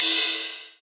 crash single hit f sharp key, Royality free cymbal crash sound tuned to the F# note